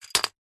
leisure game disc drop into grid tower game play single; connect 4